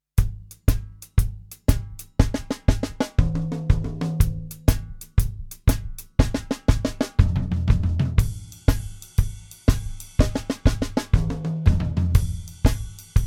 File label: Drumnastics; solos for drum kit and snare drum aimed at the beginning/intermediate student; Percussion Ensemble Music; DRUMNASTICS by Peter Matzick; A collection of 10 solos for drum kit and snare drum aimed at the beginning/intermediate student